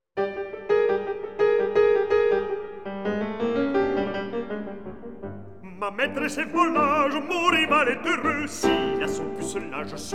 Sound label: song cycle for voice & piano